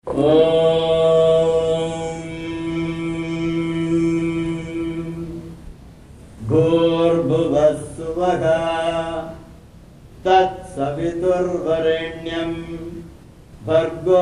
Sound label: gayatri